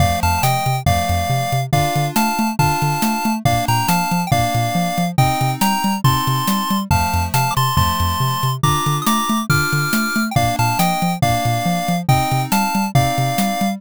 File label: bgm street